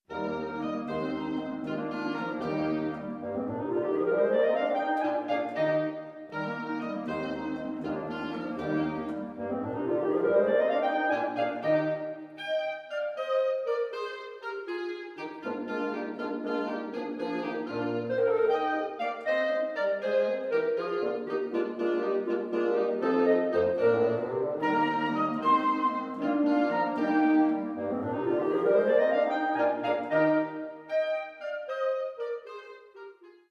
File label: Quintet; in Eb Major, op 88, no.2